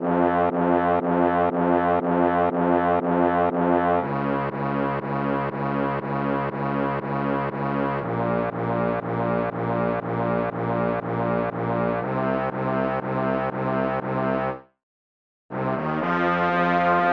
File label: low brass fanfare; Transformers